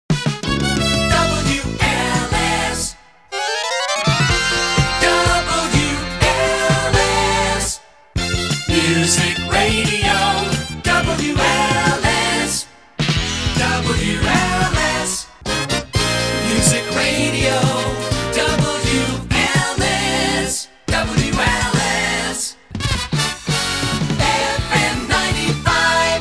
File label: Jingles; WLS AM FM 1981; JAM Jingles